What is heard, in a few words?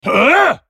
spellcast effort